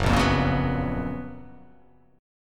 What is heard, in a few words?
Gb Major 11th; GbM11 strummed; JGuitar